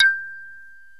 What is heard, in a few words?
BOTL MARIMBA; OMI - Universe of Sounds